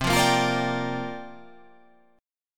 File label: C 9th Suspended 4th C down